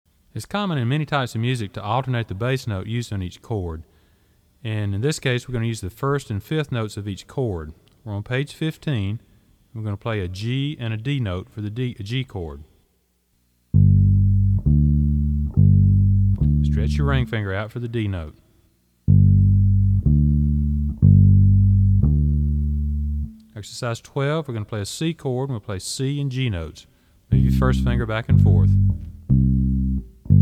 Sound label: Alternating Bass Notes